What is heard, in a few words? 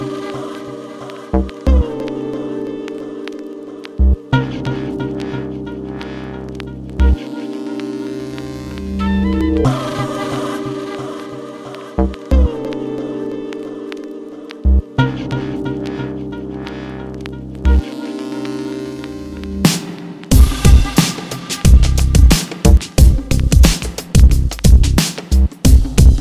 Beats Ringtone